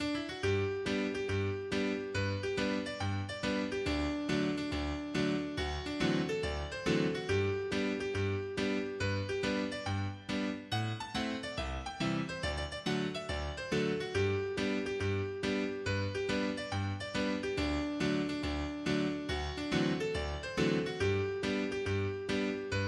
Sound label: trumpet hornpipe; The Trumpet Hornpipe